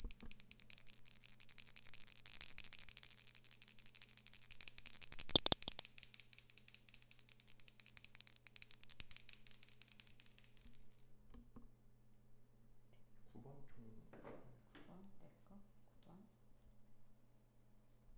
종

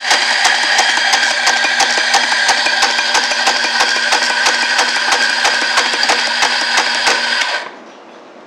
커피 머신

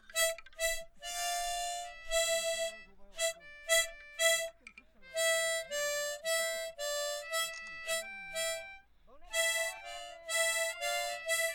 하모니카와 멜로디온; beach

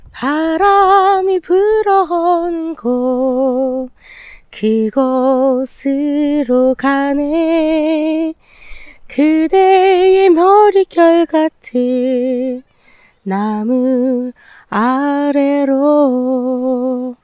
바람 불어오는, beach

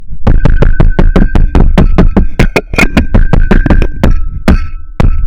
두드리는; beach

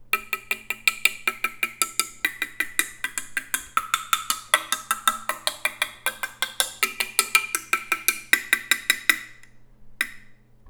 툭툭

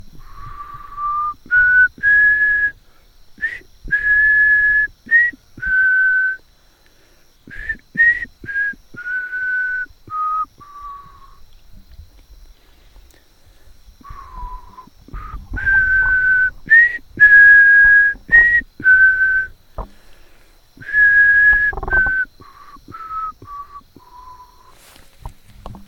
휘파람

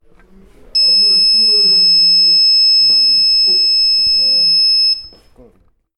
삐-

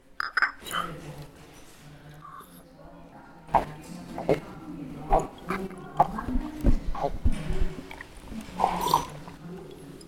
주스 마시는 소리

